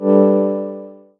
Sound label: Music Frontend